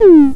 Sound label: PRC, Disco Fever TOMS; Best Service ProSamples vol.48 - Disco Fever; AKAI